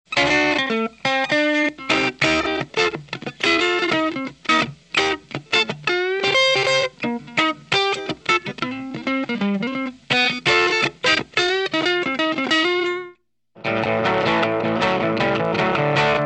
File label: dirty AC-30